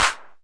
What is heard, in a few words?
clap, Roland CR-8000 drum machine